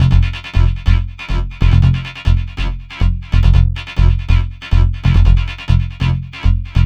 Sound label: Bassline; Drums & Drones